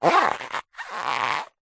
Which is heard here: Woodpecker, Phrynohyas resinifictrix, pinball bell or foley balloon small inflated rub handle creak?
foley balloon small inflated rub handle creak